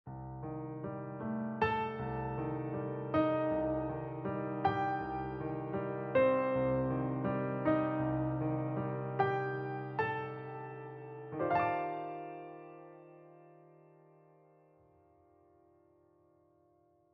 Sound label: music (Final boss ending)